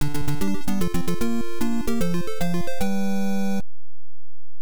Key Collected; King Kong 2: Ikari no Megaton Punch; NES

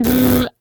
Caramel All Sound; Jolly Battle; Android

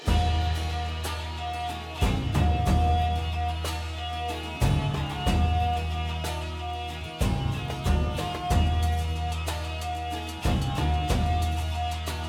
Low Key Positive Track; Crusader Kings III

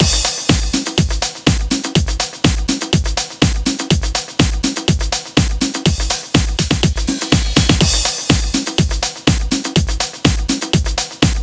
BGM Loading

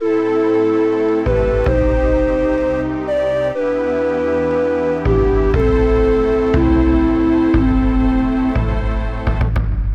Jingle BGM GAMEOVER; Lost in Blue; nintendo ds

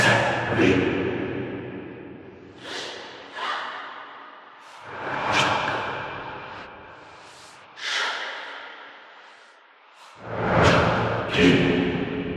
Ritual Chant; Fortnite; Inquisitor

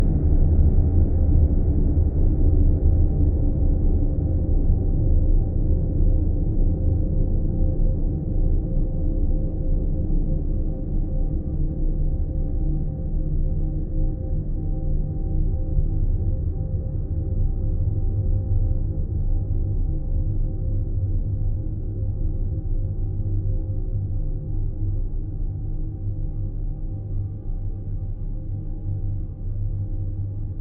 Ambience Quiet

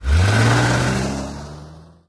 Pickup Leaving

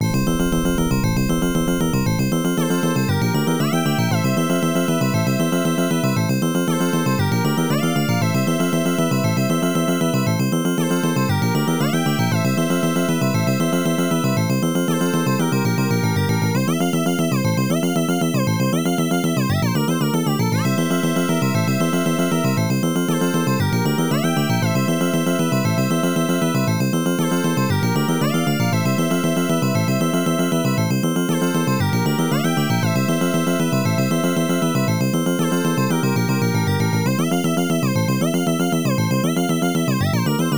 Unused BGM

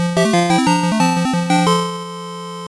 Game Start; F-16 Fighting Falcon; Master System

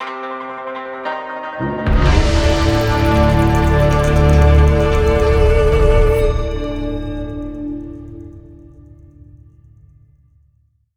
Round Start; Zombie DLC5 - Shangri La

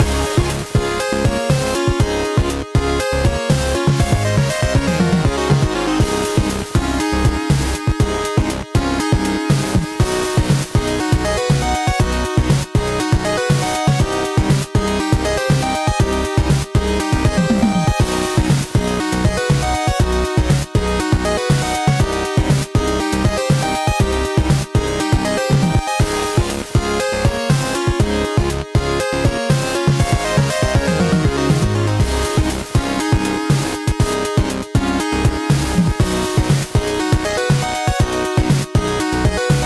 Town Loop; high strangeness; wii u

